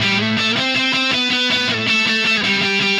Happy Pub Guitar

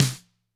GRCH LO, GRETSCH SNR; ILIO; Double Platinum Drums 1

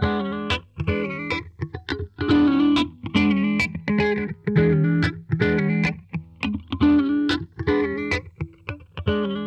SLO FAZE, Funk Guitar; 90 s Sample CDs; Zero G

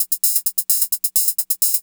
drum 130 hihat, Techno Trance, Drumloops

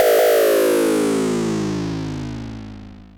Klone Dual-Percussion-Synthesiser, DRUMHITS; KLoNe MONO; 300 Drum Machines

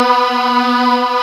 GLASS B3; D50 STORY; East West; Global Sounds

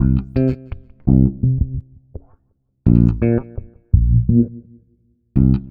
Filtered Funky Bass, Electric Bass

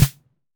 Snare Pairs

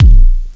KICK R; Big Beat Samples & More; AKAI

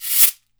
CABASA, Brazil Drum Perc; E-MU Producer Series Vol. 7 – Old World Instruments

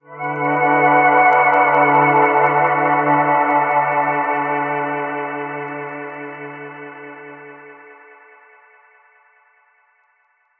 In Dub, Underground UK House & Electro, Pads; Sonic Foundry (Sony Creative Software)

